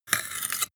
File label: sword scabbard handle pull soft short